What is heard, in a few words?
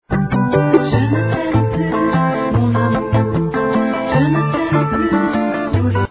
bongobong